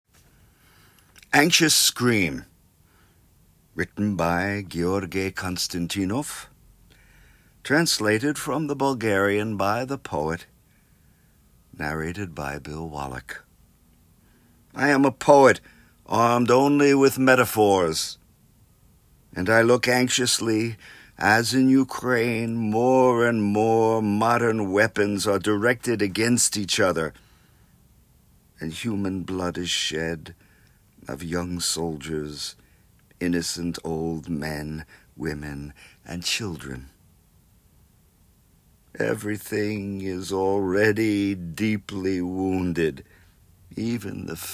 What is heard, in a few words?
Anxious Scream